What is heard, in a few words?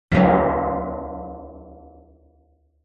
impacts metal hit drum gong like